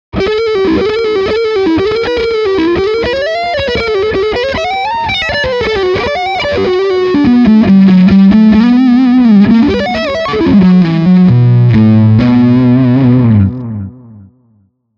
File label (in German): Leadsound Front PU